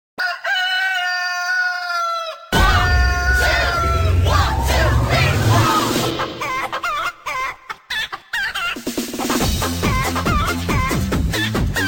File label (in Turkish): Tavuk